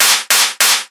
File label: Dis snare